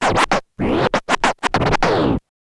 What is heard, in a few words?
scratch kit, vinyl